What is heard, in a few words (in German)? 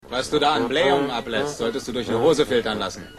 Blaehung